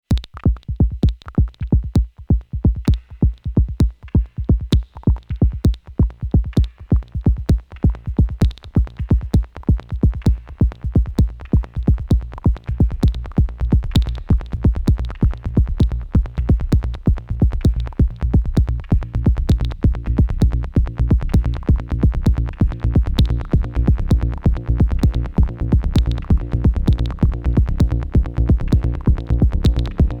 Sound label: Tech; Workshop Soma Pulsar 23 Patch